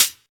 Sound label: muted closed hi hat sample d sharp, Royality free hat sound sample tuned to the D# note; hat samples